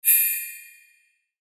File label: Pixie Dust High Fast Shimmer; Magic; Blastwave